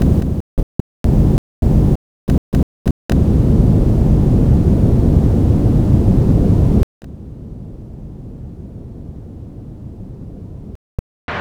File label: Samples Rauschen